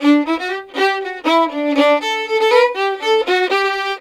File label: 120 D violin, Celtic Flavours; USB Soundscan vol.42; AKAI